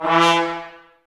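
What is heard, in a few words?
trumpets E, brass; 90 s Sample CDs; Miroslav Vitous; Woodwind Brass Ensemble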